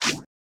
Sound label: sfx, futuristic weapons sound effects